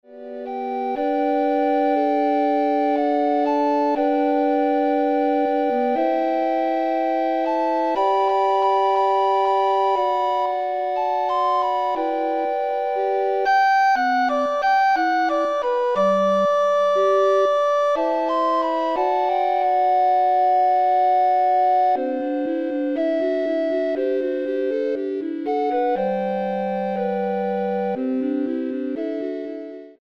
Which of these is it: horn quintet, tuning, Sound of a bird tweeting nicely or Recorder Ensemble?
Recorder Ensemble